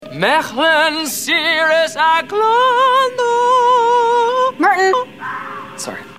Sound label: chant